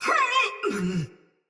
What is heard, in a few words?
Death Soldier Mace Agent Female; Battle; Sinecure Melee